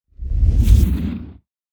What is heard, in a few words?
Just Whoosh; SoundBits